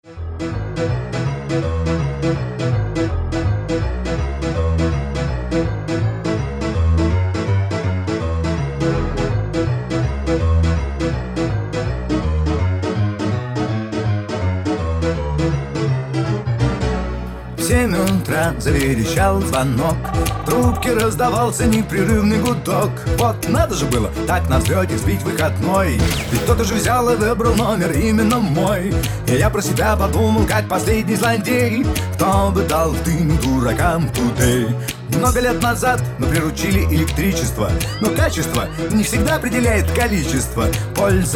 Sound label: Jive 41 bpm